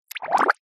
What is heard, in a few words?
cartoon bubbles